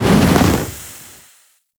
Lizzie Fire Shot Loop Stop; Warframe; weapons

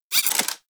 Pistol Mech A; Tenno; Sybaris

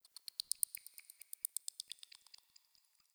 clicky insect; Warframe; Tau

